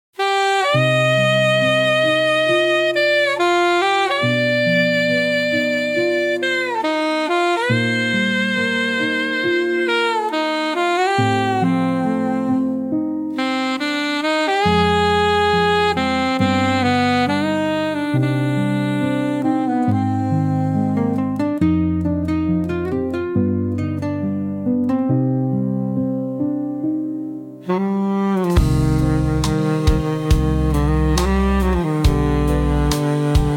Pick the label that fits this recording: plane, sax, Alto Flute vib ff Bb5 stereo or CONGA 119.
sax